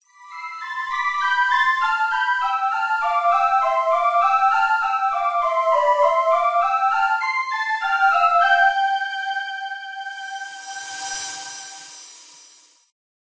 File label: quest complete; Mountain Daughter